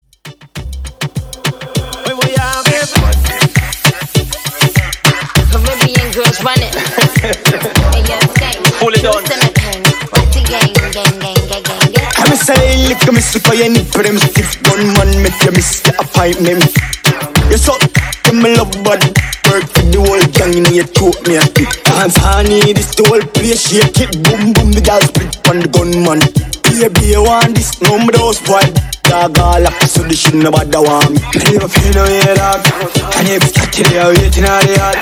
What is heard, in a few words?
Dancehall 100 BPM; Top40s